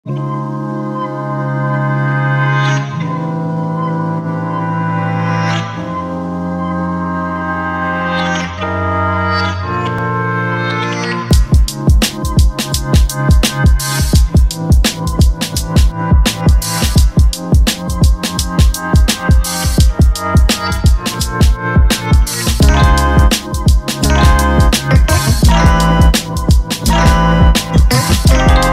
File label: Kalimba